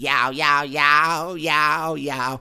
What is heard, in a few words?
Rap Vox